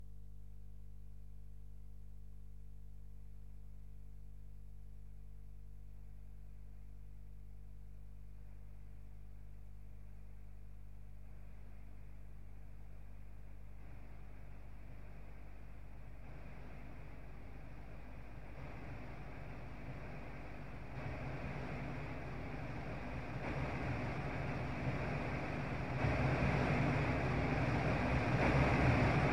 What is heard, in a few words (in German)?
Brownsches Rauschen